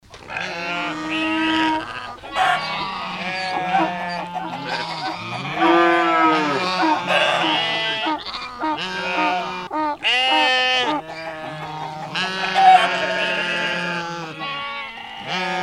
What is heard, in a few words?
Animal Sounds Performance Mix